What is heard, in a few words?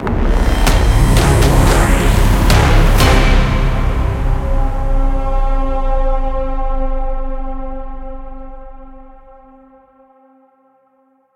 Jackpot Intro Splash; mercy of the gods